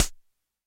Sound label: virus pop